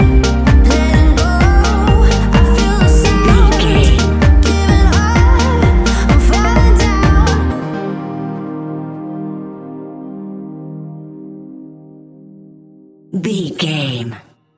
barefoot euphoria, A fast paced piece of club music; A fast paced piece of club music perfect for the nightclub, and a youth orientated visual scene.